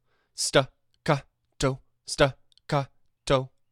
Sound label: Staccato example